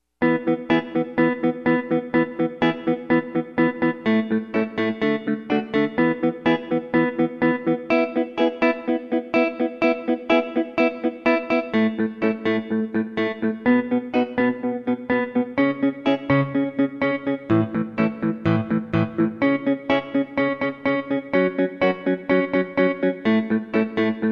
rock rhythm guitar

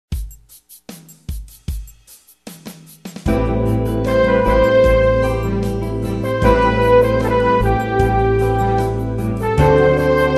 pop tutti

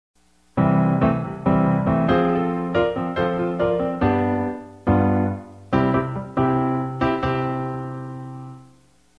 harmony piano blues

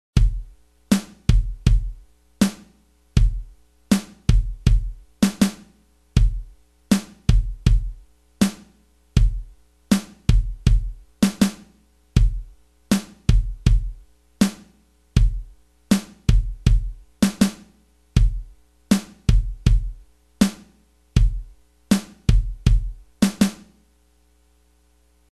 drumset snare bass drum

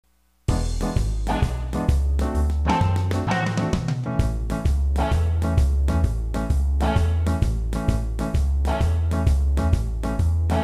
blues all but melody

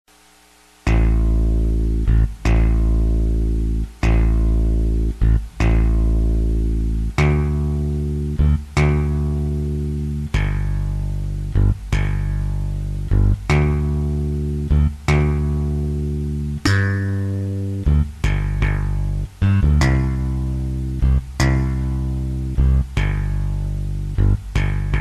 pop bass

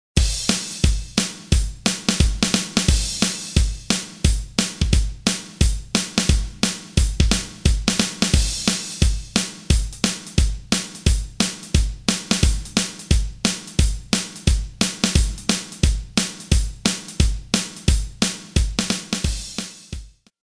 country drums

